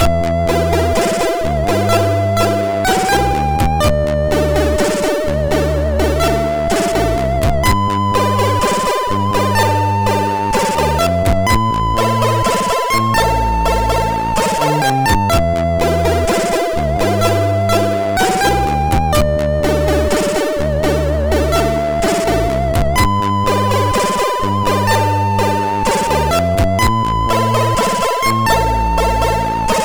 sid MOS6581; Magic Caves

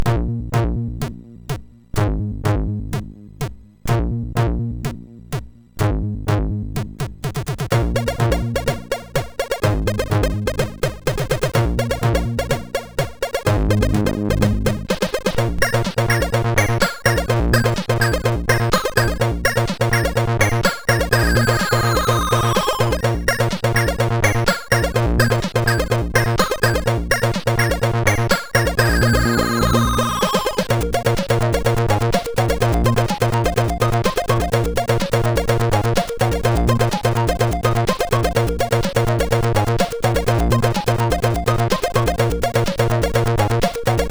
Engine, sid MOS6581R4

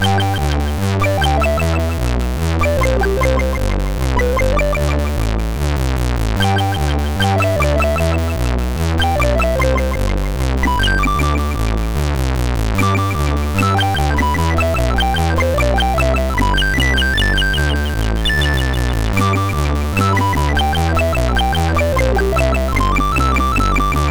sid, MOS6581R3; Lupo Alberto

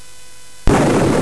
Dark Castle, CSG8580R5

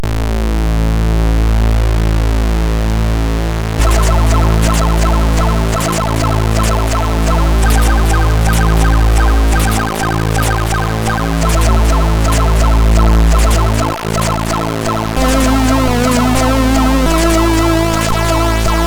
Sludging tune sid MOS6581R2

